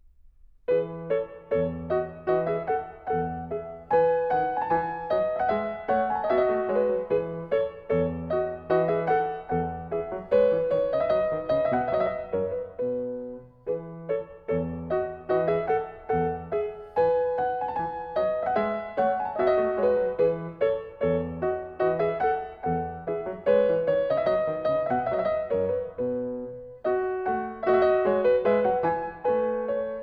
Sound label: Gavotte